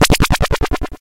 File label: Freeze Magic